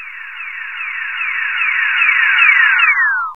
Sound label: L F O 2; AMG - Vince Clarke Lucky Bastard